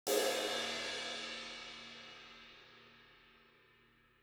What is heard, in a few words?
Cymbal